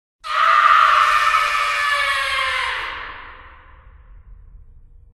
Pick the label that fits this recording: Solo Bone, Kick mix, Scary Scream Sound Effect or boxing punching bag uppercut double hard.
Scary Scream Sound Effect